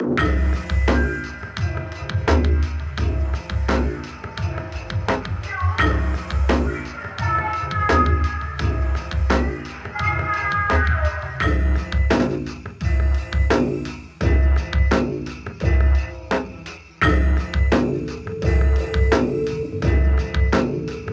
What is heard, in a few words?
Dark reggae